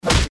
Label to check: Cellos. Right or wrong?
Wrong